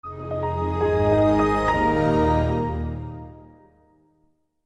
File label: Xp Start Up